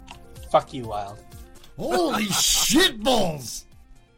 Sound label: dubstep